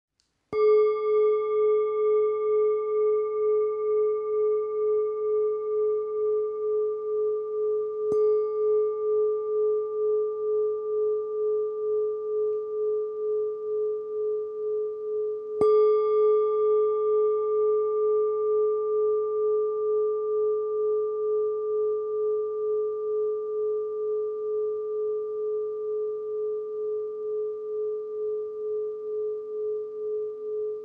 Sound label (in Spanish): Sol, cuencos tibetanos